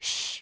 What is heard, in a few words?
Human Male Shushing